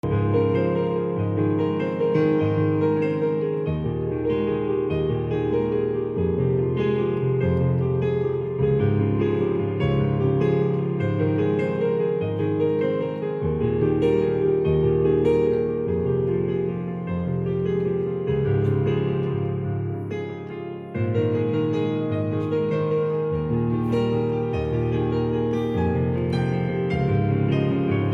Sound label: piano solo